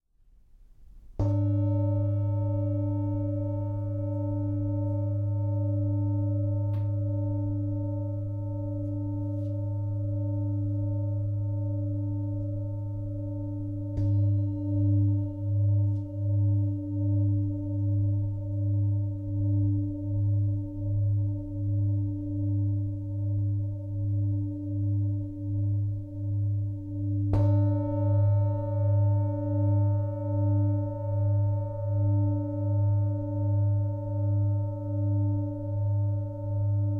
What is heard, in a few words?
singing